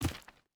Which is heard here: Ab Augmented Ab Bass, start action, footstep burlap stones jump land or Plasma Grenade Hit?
footstep burlap stones jump land